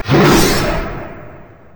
yasuo base crit attack; LoL

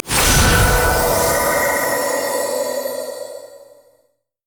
summonerspell base heal; LoL

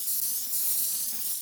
rumble carpet bomb effect loop

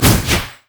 monkeyking decoy swipe; LoL

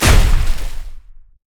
ravage strike; nautilus

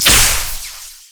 basic attack; Viktor

